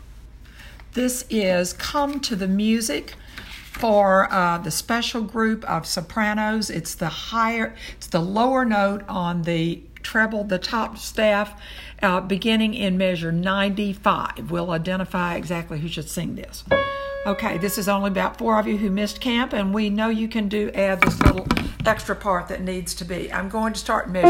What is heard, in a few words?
Sop Lower Part, childrens choir